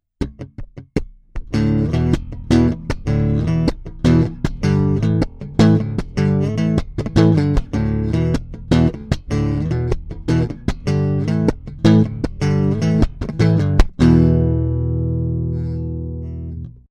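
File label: Warw Strea 2PU Chords, bass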